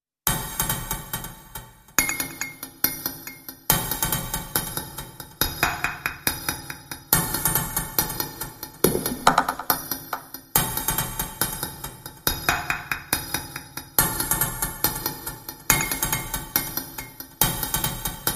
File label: Hits Only